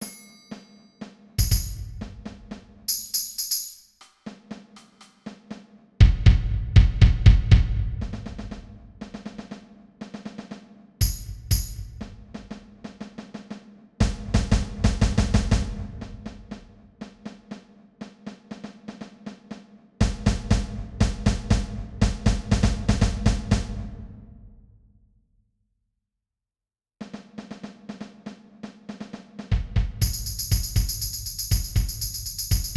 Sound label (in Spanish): batucada; para intercentros